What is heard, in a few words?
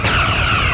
Phasor; Space-Smash-Out; SoundManager Demo